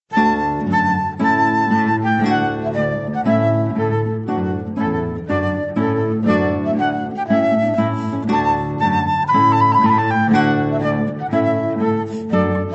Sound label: Trumpet Tune Trio